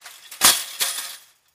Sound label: Chain Link Fence Gate; fences